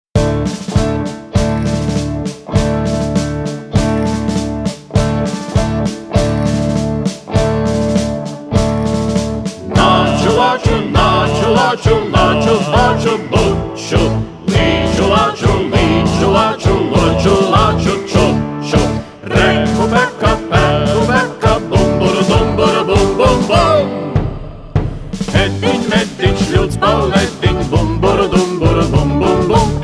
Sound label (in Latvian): polka; Lāču polka